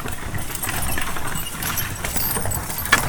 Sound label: Horse Drawn Wagon; Electa Quinney